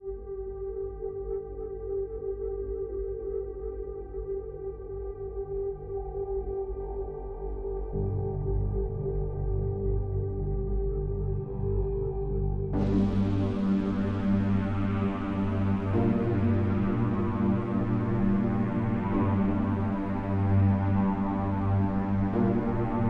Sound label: Drone, Light